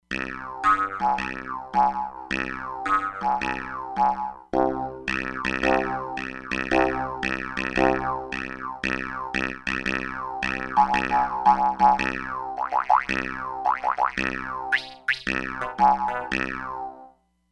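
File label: Ambi Jews Harp, E-mu Proteus-3; Factory patches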